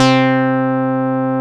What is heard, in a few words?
MC 202, BASSES